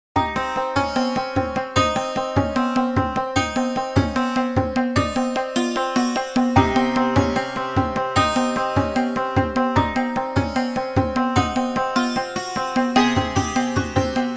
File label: Arabian dance